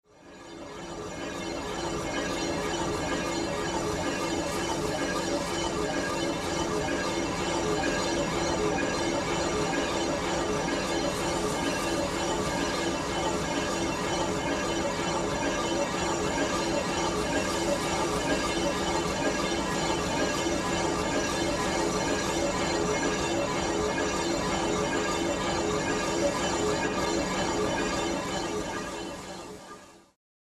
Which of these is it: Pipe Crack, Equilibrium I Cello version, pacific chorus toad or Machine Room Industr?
Machine Room Industr